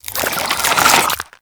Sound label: Infested Weakpoint Retract; Warframe